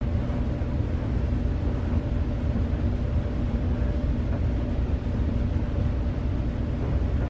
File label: washing machine